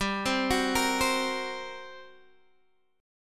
G Minor Major 7th Double Flat 5th, G, Guitar, Open D